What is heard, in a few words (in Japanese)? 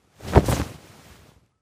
futon